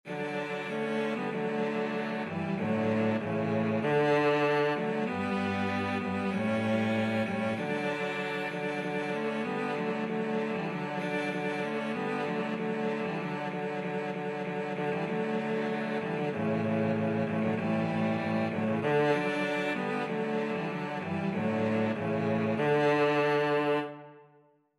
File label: joy to the world, cello trio